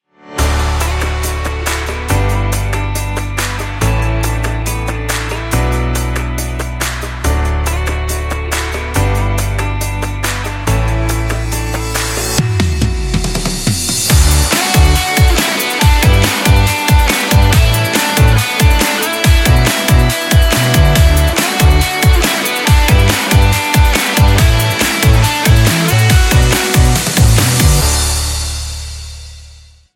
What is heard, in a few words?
Upbeat Pop, ringtone; MIUI12